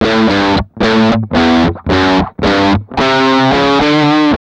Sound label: GUI, licks; 110 LICKS